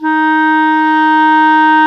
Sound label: CLAR, Clarinets; Roland LCDP04; 90 s Sample CDs; Clar Cls